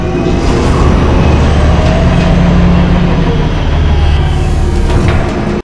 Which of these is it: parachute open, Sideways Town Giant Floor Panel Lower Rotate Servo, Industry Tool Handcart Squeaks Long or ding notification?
Sideways Town Giant Floor Panel Lower Rotate Servo